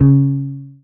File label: marimba guitar; ゆめ2っき